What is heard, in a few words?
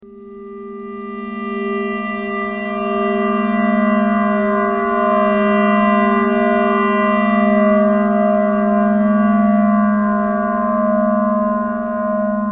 clarinet thread noise